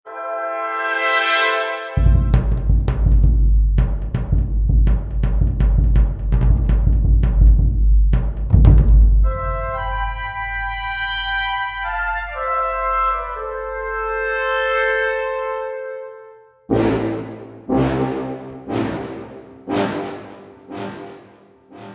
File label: Fanfare